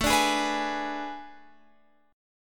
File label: A Major 7th Suspended 2nd Suspended 4th, Guitar, Open F; AM7sus2sus4; strummed